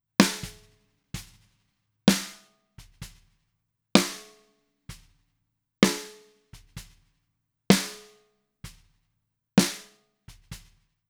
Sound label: snare orig